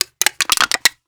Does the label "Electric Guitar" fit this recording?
No